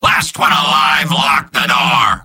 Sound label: battle cry; soldier; mvm